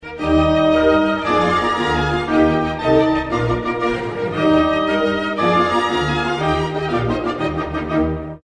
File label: horn concerto; Mozart; Horn Concerto No. 4: III; 1786; lively